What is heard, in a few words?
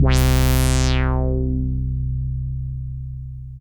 SYNTH Sweep bweep